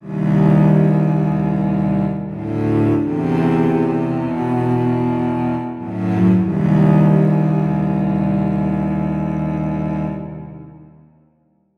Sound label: Dark Cello